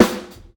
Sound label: subtle reverb snare one shot a sharp key